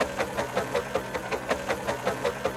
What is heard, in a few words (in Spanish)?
Helice loop